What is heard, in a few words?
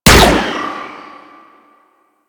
Hyena Gun Fire, Enemies; Warframe